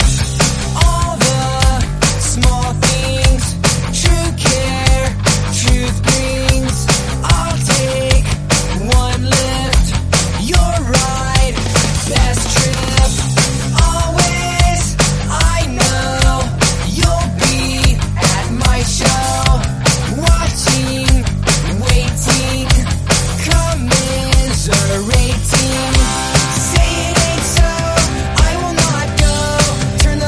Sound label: REDRUM Slam End 148bpm